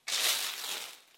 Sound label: Welding Sparks; SOUNDSCRATE